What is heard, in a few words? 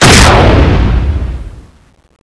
summon stab fire, weapons